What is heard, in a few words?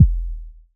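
kick, ARP Odyssey Drums; Polyend; Simulacrum Sounds; 1 Shots; Synths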